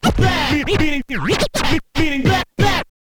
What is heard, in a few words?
scratch kit, vinyl